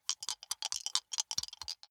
big two rattle in hand closed

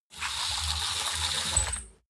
roll-down curtain roll down

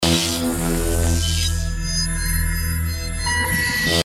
electric movement loop

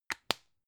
plastic spray can small close short

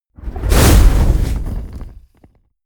whoosh fire ignite medium

